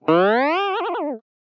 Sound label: Cartoon Effect Guita